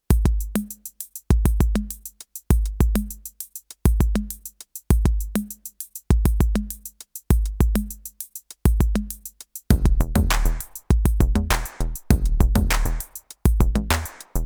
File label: Electronica dry